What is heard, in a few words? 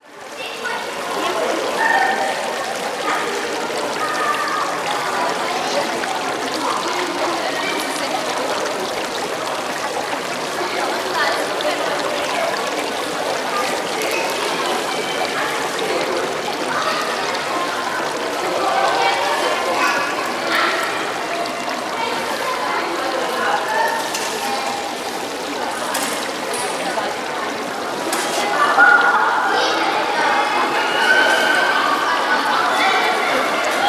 ASMR Ambience Water Park